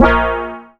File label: BELL-GONG, A#; Big Fish Audio